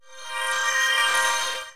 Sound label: notify